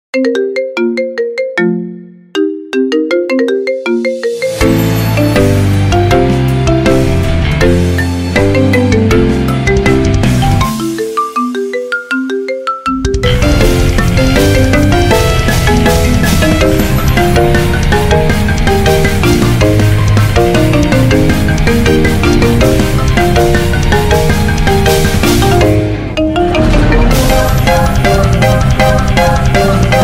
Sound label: Marimba; Attack on Titan